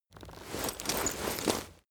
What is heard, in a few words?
leather metal handling long